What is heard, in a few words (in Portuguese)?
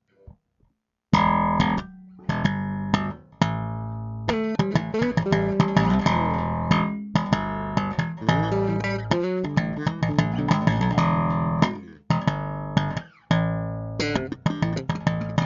improviso